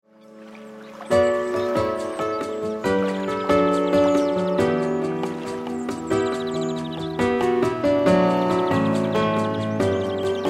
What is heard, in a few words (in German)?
Entspannungsmusik